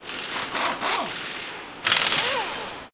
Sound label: startup, gui